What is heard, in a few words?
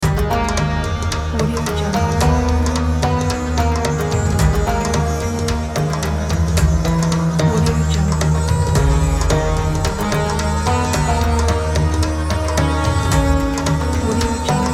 oriental flair ident